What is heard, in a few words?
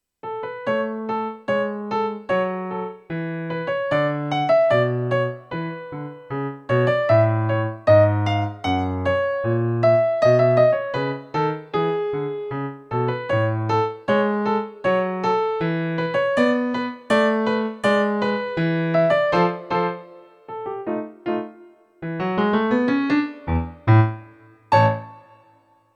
Piano; In Funny Mood